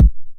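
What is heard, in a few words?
909 BD tape, kick drum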